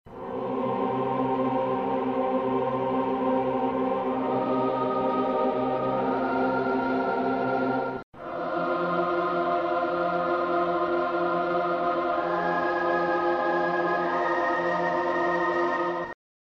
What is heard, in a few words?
raven choir